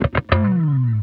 Guitars, B; Total Drum&Bass; 90 s Sample CDs

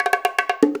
FILL, Percussion

